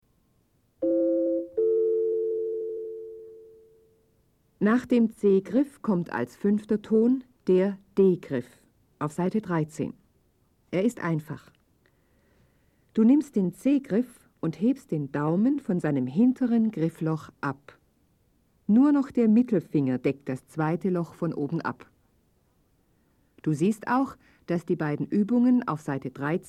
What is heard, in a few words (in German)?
Spiel Blockfloete